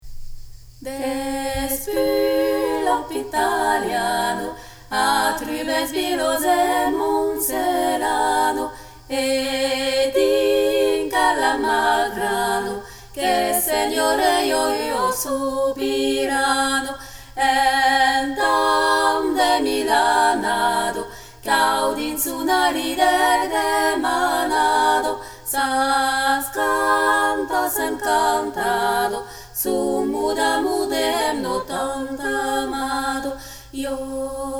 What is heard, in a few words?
tutti; la sobirana